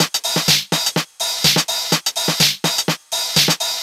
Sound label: drum loop twosna top 125; Classic Chicago House; Sample Magic